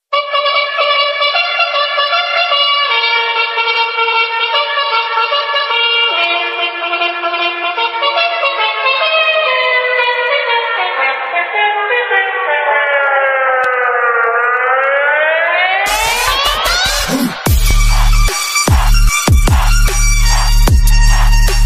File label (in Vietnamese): Nhac bao thuc quan doi remix